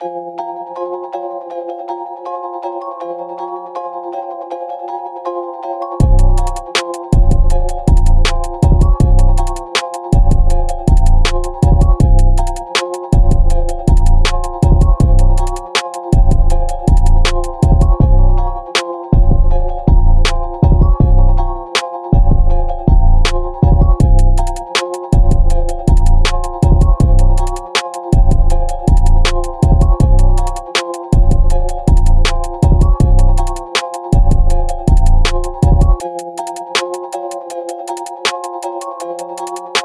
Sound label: Type Beat